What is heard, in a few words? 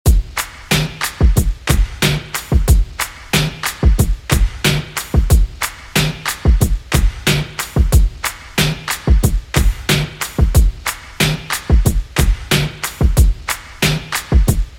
Xmas Redrum 91Bpm